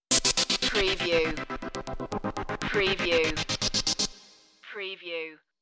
bustle hustle stinger

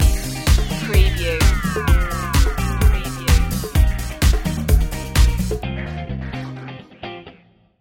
pop city stinger

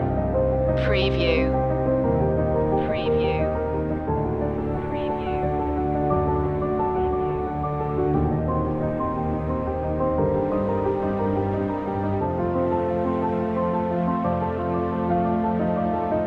motion loop

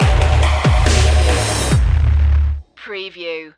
the eye stinger

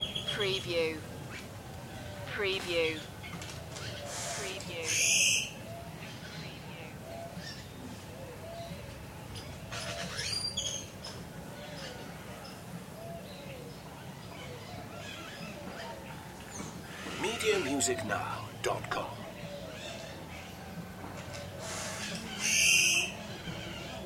village morning, nicaragua

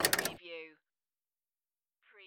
Pinball sound, rattling metal

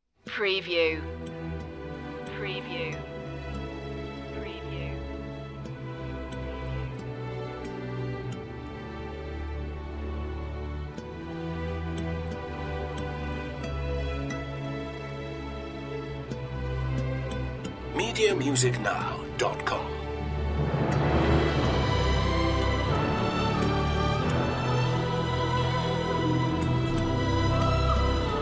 intro part with choir and guitar

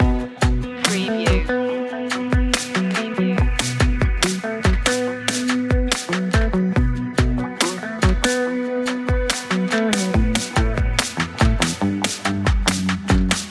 dark trap loop

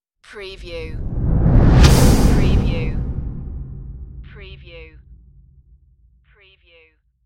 hollow whoosh

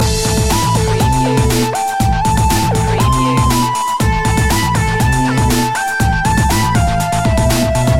unnatural science loop

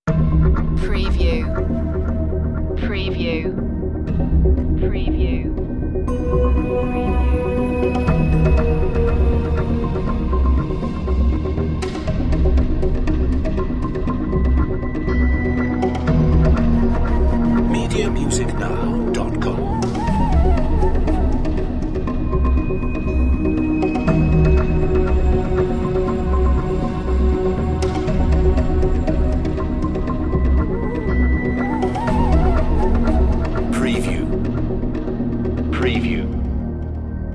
underworld series dramatic cue

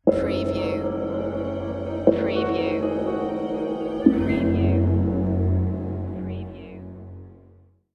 Dark Production Stinger

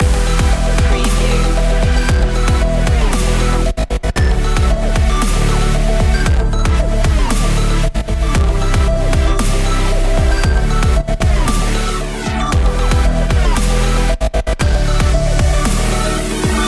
cosmic funk loop

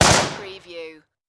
Machine Gun M4A2

